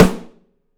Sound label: PICOLO; 3D Drums